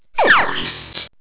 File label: phasers; priest